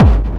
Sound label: BD, Dance Mega Drums; Best Service